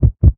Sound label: damage hits explosions